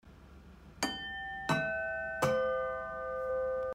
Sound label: Harmony Flowers Major Posy Red Blue Yellow